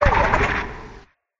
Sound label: cry